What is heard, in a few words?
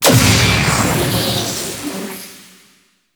Keyglyph Activation Eximus; Warframe; Entrati; Vaults